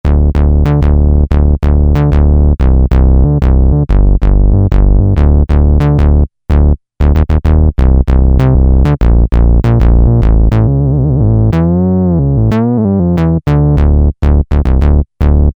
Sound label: Bass, Korg MS2000; Factory Patches